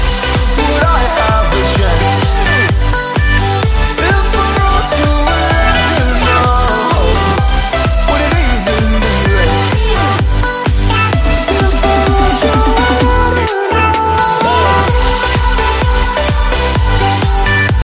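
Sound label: new electro english ringtone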